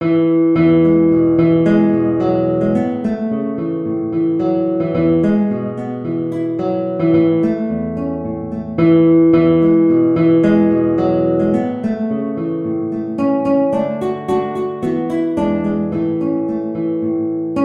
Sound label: guitar